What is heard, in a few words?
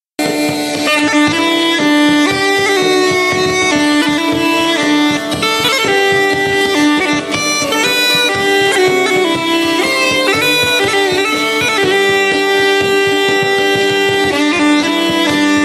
Bagpipes Happy Birthday